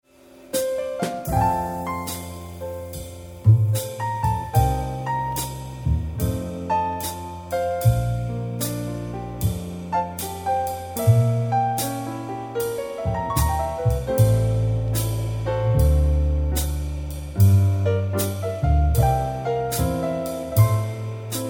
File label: jazz lounge trio